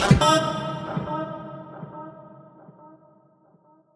Voice Tweak D# 140 BPM, Psytrance; Voices; Tweaks; Ghosthack; Psytrance Visions Volume 2

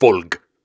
Syllables and Imaginary Words, Viking Vocal, Hard

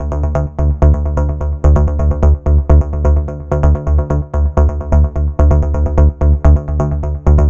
Bass Loop Powered D 128, Melodic Techno; Ghosthack; AC2024 - Day 12 - Melodic Techno

